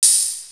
Percs

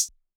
Hi-Hat, Closed Hats

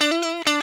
Stabz

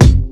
Kick

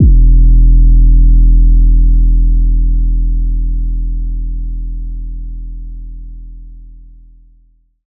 808 - E; Cymatics

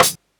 Snares

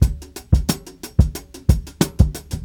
Loop

